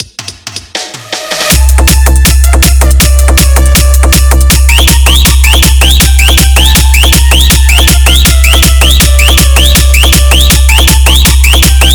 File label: Fast Vibration Punch Remix Song